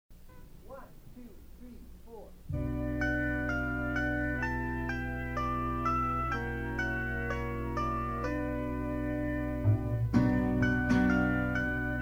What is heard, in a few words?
Untitled Instrumental; unreleased